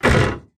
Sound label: slam